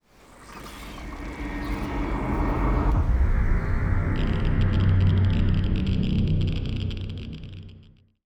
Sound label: georadiation terraforming; Stellaris; extreme frontiers